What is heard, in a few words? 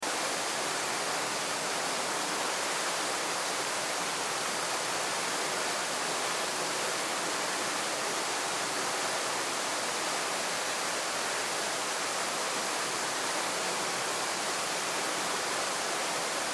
Hotel Lobby Waterfall Interior Loop; Room Tone; Ambience; Blastwave